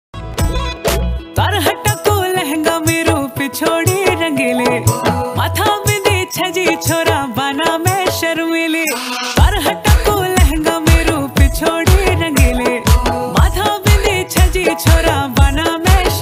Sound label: uttrakhandi ringtone